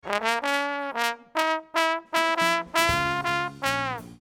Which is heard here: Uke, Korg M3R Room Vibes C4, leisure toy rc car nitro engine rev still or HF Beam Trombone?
HF Beam Trombone